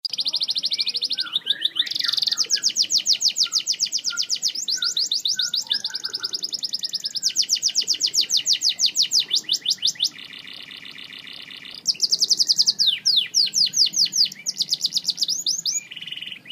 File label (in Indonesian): audio kenari snow white versi panjang